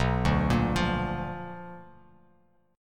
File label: B Minor Major 7th Flat 5th Bass Standard